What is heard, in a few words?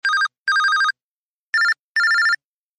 Simple Beep; LG VX5600 Accolade